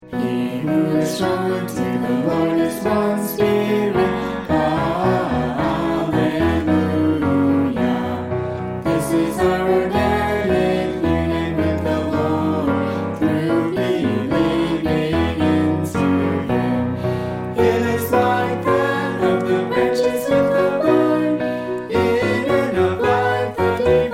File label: sing, hymn; New Songs